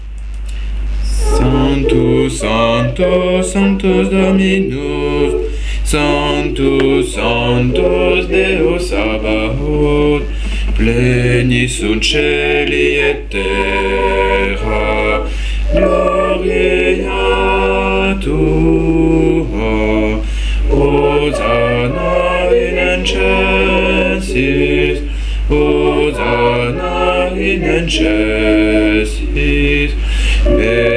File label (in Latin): Sanctus basse; mariage